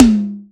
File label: urban tom sample g sharp key